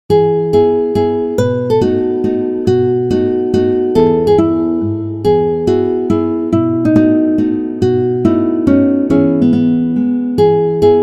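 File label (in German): La Donna E Mobile, Gitarre; Verdi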